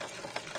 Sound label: crank loop; p00gie